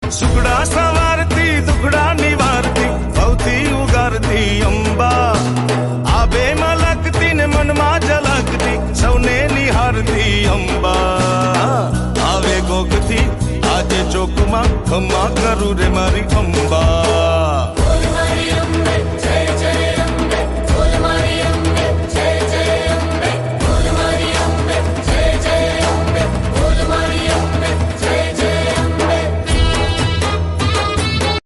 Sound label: new garaba song gujarati